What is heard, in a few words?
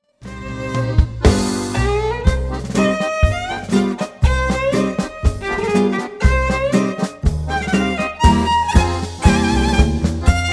klezmer